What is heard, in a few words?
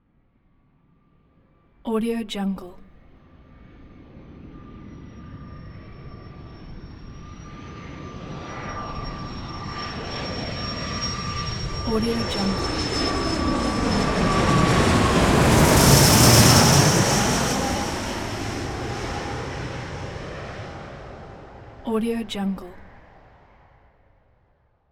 jet pass by; blast drive 30; prosoundfx